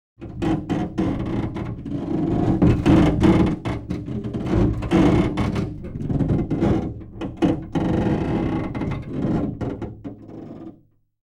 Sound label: metal stress creak, foley effects; Asian Show Sound Effects